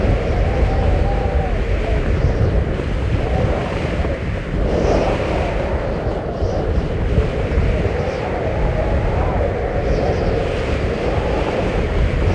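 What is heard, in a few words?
Ambience Golf Crowd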